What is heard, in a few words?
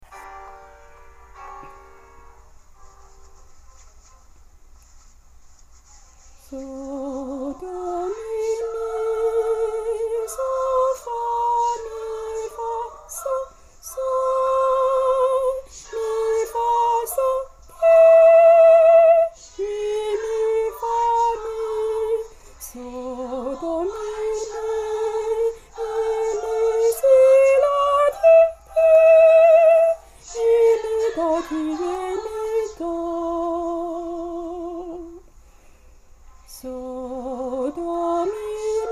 bd alto